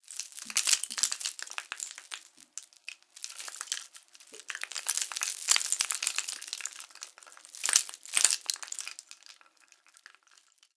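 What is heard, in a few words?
rattlebone, percussion; ILIO; Synclavier Percussion 2; World & Orchestral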